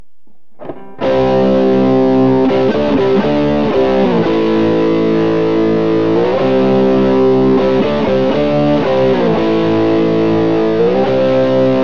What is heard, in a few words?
neck crunch; Burny